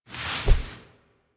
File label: Foley Idle Alert Foot Down; Enemies; Kubrow; Warframe